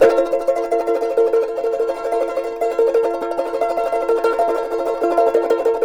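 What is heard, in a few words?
charango B minor tremolo; Spectrasonics; Hans Zimmer Guitars VOL-2; CHARANGO CHD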